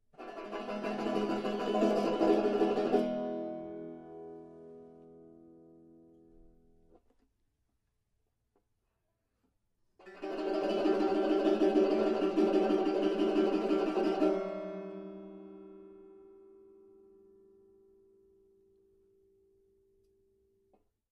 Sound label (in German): flacher finger, banjo